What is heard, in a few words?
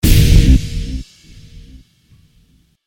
Guitar Amp Noise